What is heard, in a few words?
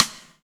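Regular Stick, Rimshots, Roland BK-5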